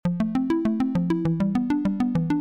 plucked

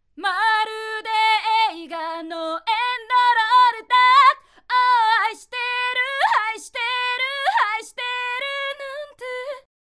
Vocal

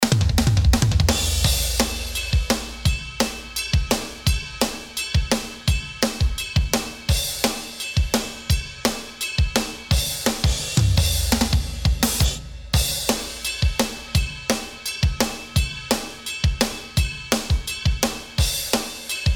drums after

